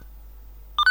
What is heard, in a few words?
Siemens x45 Ton